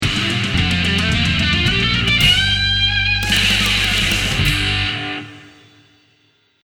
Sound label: Quick Metal Riff